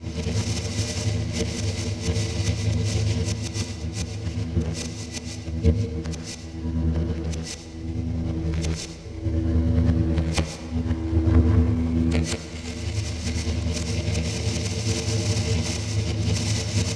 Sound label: Goodyhut Electric Lp; Ambient; Sid Meier's Civilization 4 Complete; Beyond the Sword